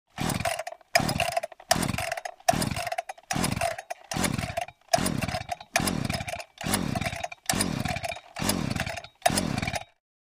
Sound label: chain saw